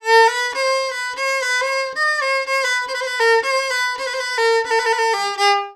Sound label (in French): VIOLON, ArabianTraditions; USB Soundscan vol.43; AKAI